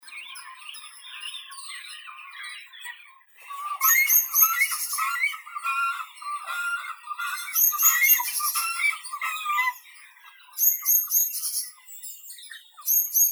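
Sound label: great cormorant, phalacrocorax carbo; baklan bolshoi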